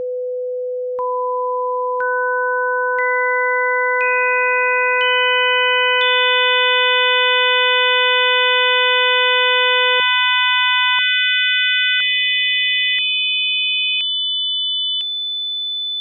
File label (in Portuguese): serie harmonica; musicologia